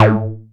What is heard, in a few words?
OB A3 -L, Rare Synthesizer; Hollywood Edge - Giorgio Moroder Rare Synthesizer Collection; OB 4 VOX.